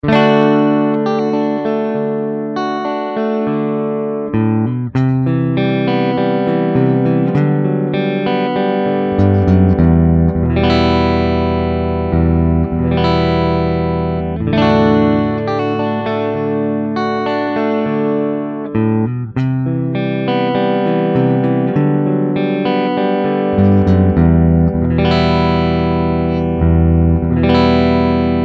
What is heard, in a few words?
PAF Neck Humbucker